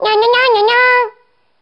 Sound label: meow; n64; 「にゃ にゃにゃ にゃ にゃ!」